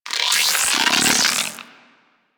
Inf Quanta Charge A, Weapons; Warframe; Infested

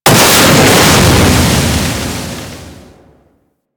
Tunnel Fire Start; Grineer Spy Vault E

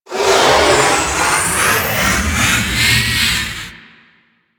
Baby Dragon Split Shot Charge; Enemies; Warframe; Duviri; Void Dragon

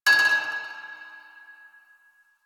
Pipe Cement Drop; Warframe; Ambience; Nineteen Ninety Nine

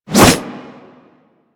Jump Attack; Manic

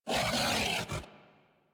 Feral Vocal Melee Attack; Enemies; Kubrow; Warframe

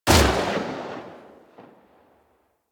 Burst Rifle Shot; Weapons; Grineer

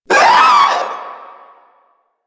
Proto Infested vocal short attack

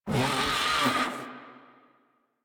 Catbrow Combat Vocal Death; Warframe

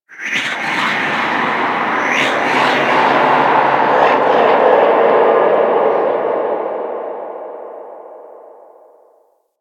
Moon Whispers Medium, Ambience; Warframe; Orokin

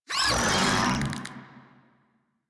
Desert Skate Vocal Attack B; Warframe

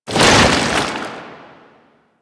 Chain Reaction Cast; Warframe; Warframes; Qorvex

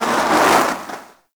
Eruption Fire Extinguish; Sargas Ruk

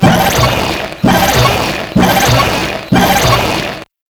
Purification Machine Charge Loop; Infested Survival

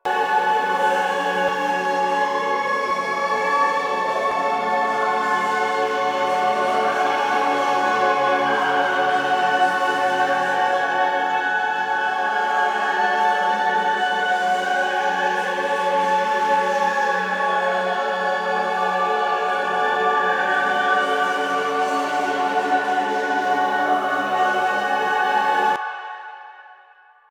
Choir Erupt Move Loop; Warframe

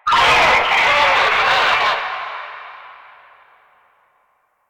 Group Death Cry; Effervon Lasrian; Warframe; Nineteen Ninety Nine; Quests

